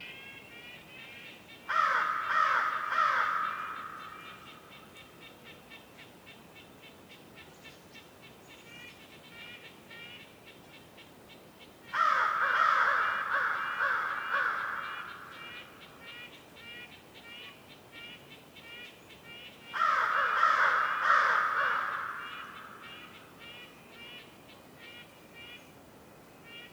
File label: WINTER Forest morning crow calls echoing and various birds chirps, North Laurentian Woods; Winter Forest Ambience; Canada